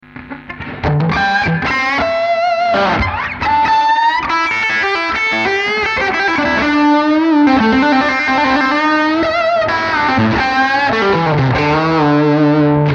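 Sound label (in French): Bridge - saturation, micros, strat-like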